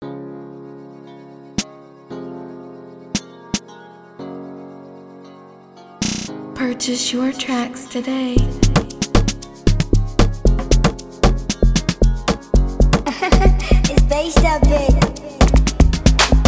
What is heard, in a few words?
Forever 115BPM C major Free Version; Prod. By Bazestop